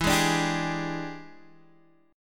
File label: E 7th Sharp 9th Guitar Standard, chord; E7#9 strummed; JGuitar